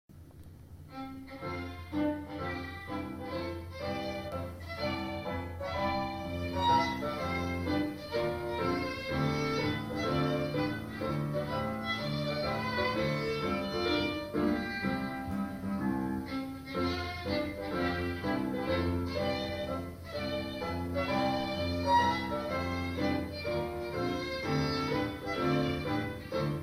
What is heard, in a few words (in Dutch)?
Caterpillar march, Viool en piano